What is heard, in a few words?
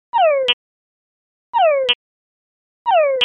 palatable tungara frog; bats; bats learn to be discriminating when listening for their next meal